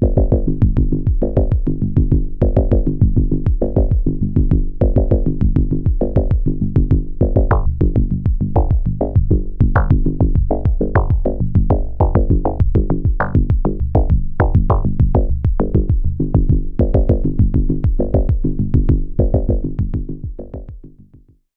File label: Behringer Syncussion SY-1 Bass Sequence; Mittelteil